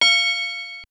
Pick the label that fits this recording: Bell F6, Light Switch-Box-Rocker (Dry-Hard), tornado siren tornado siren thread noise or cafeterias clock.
Bell F6